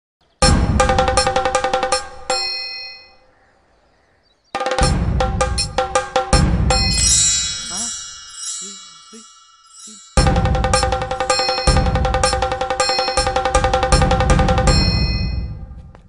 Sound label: Drums